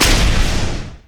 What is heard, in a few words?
thunder claws; LoL; volibear